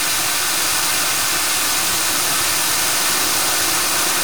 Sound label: fountain firework; ambient; newyear; dota